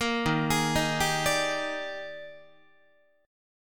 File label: Eb Major 11th, Guitar; EbM11; arpeggiated; JGuitar